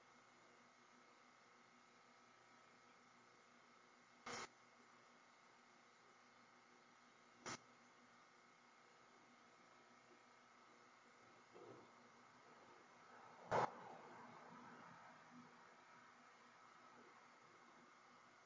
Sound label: Chapel Static; fairfield county infirmary return investigation